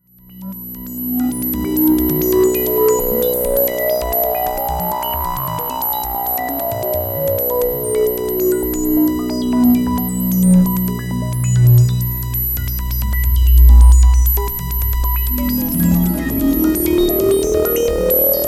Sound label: Roland JD800 Sample Hold